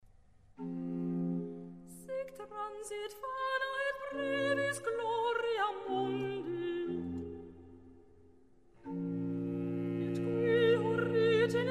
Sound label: for Soprano solo; Ostro picta; RV642; Antonio Vivaldi